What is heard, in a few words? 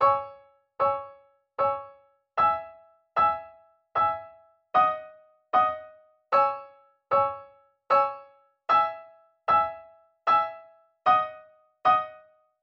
Boesendorfer Grand Piano